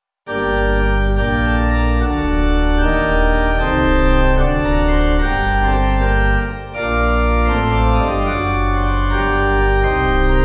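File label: Pipe, Pipe Organ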